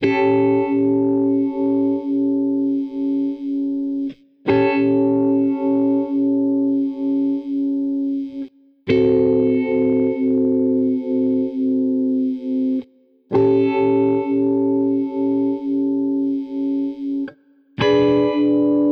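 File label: Blues Guitar; Pro Tools Essential Loops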